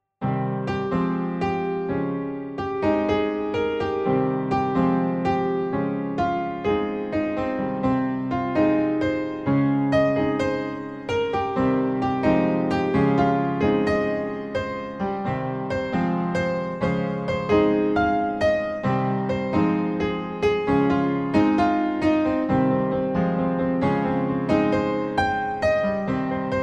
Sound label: Piano Dancing